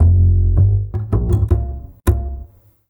Standup Bass, Drum N Bass

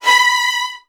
B3, VN 16TH, Virtuoso Strings; ILIO; Kirk Hunter

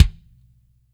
DRY, KICKS; Spectrasonics; Burning Grooves

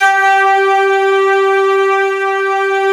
ST-ORG, STRINGORGAN, Yamaha VP-1

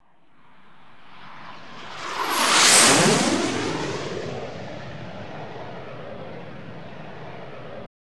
SFX FLY; AMG - Now CD-ROM (Roland); Art of Samplng

